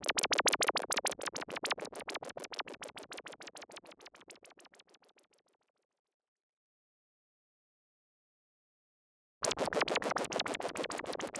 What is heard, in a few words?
Freeze MS-20